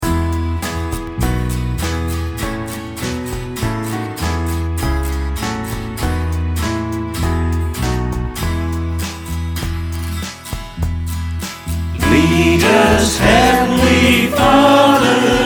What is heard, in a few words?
choir; Lead us heavenly father